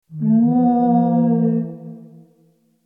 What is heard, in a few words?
voice monster; maoudamashii